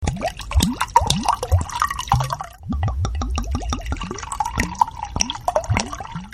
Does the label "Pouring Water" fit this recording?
Yes